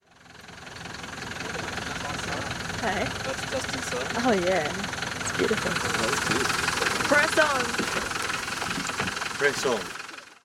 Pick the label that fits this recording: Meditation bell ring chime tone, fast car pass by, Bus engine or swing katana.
Bus engine